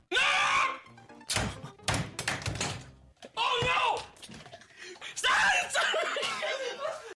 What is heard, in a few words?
Drink Spill